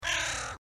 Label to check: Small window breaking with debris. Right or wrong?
Wrong